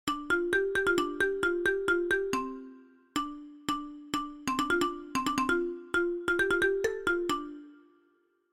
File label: Marimba